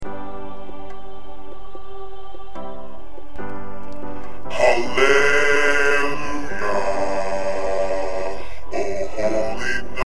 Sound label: Bass